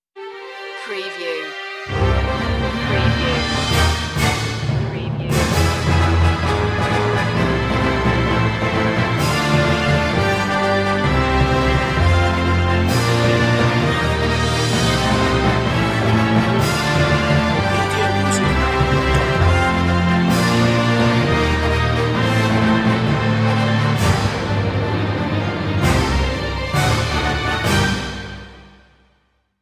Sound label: no choir